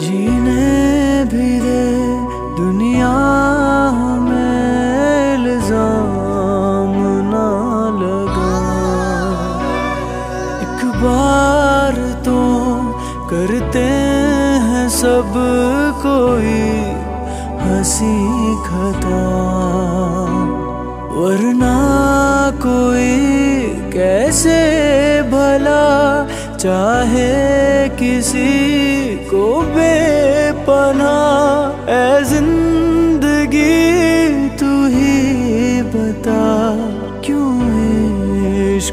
New Sad Hindi Song Ringtone

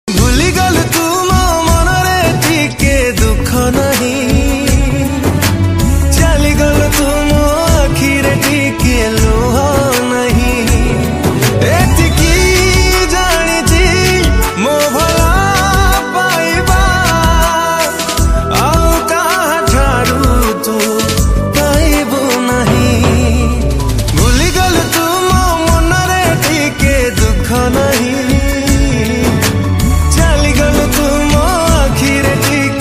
Odia Ringtone

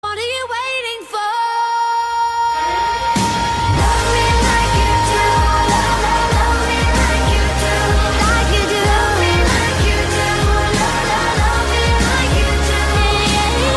Music Ringtone

